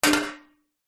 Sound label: household grater metal fall over